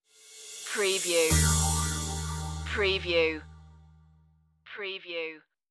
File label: boogie disco stinger